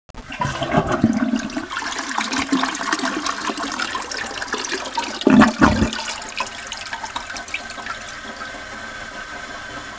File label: flush